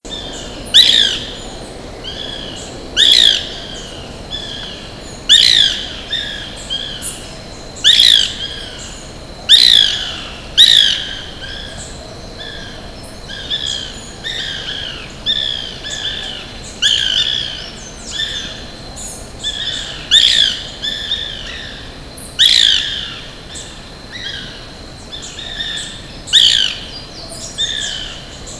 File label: Melanerpes candidus; Cantareira S Park; Brazil; A Silveira